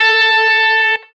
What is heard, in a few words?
electric guitar